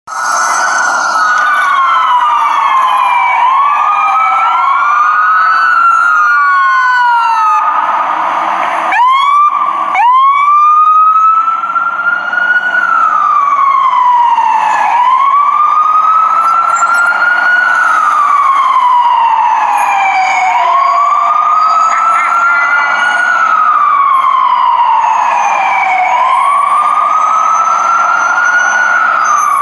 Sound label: Ambulance